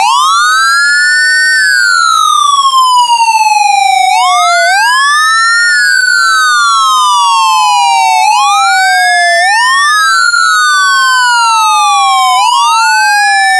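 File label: WAIL1 WAIL2, LED warning bar; UltraLITE Plus Exterior LED Warning Bar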